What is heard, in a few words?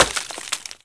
ice shatter